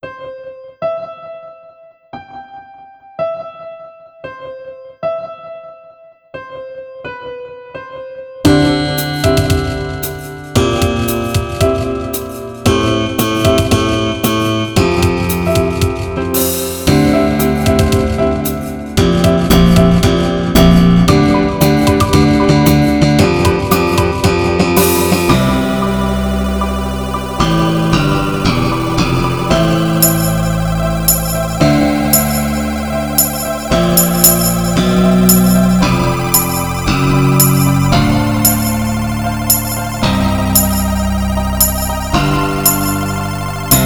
rogue bass; weeklybeats-2018

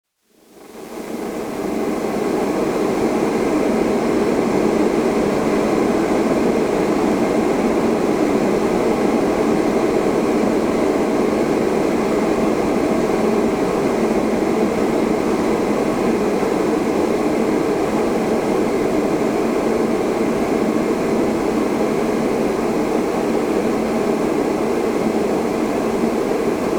noise piece; weeklybeats-2014

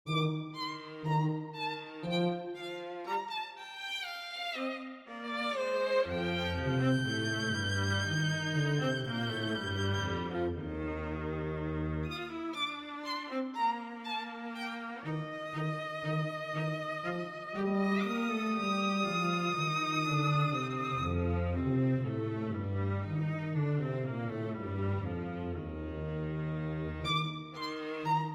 sparrow over traffic

